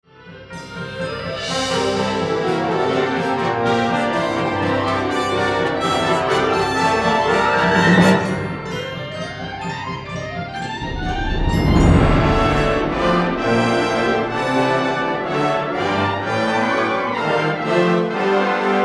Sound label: Fanfare Ritmico Band